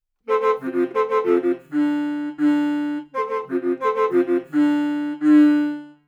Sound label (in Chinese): 低音笙 演奏技法 单吐 乐曲片段 中等力度